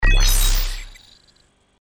freeze; Cartoon Network - Toon Cup 2020

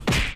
punch; Ben 10 - Escape Route; 4arms

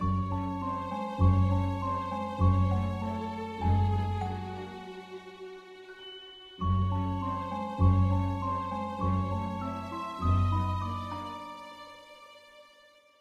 music main menu; run-dorothy

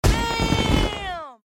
player big feet activate; Adventure Time - Break The Worm; ThrillGames

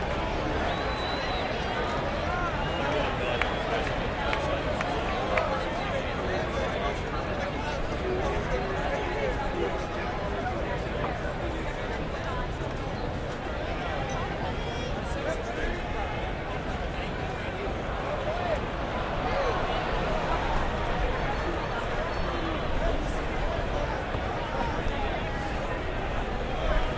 crowd bkgd; Nick Summer Sports Stars

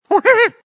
hit; Danger Mouse - Ultimate; kong

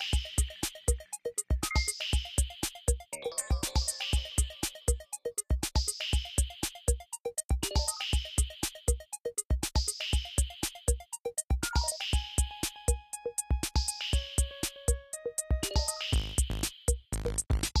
music gameplay loop; Adventure Time - Angry Betty; ThrillGames

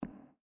slime footstep; Adventure Time - Elemental; ThrillGames

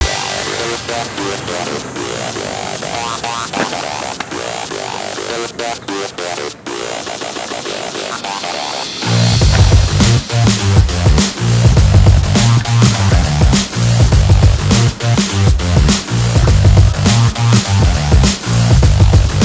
skatepark sound; Beano - JJ's Wheelie Big Challenge

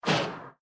fire fireball; Adventure Time - Elemental; ThrillGames

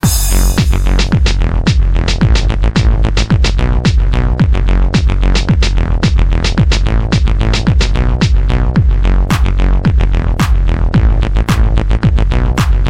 bouncy (loop); Parking; vanguard

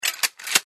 weapon pickup; Danger Mouse - Ultimate

